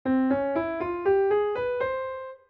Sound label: scale C arabic